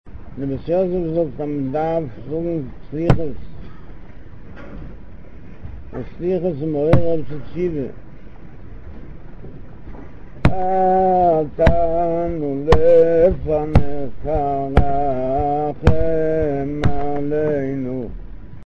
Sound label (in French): quand le rebbe de la hassidout belz entonne hatanou lefanekha selon l'air sefarade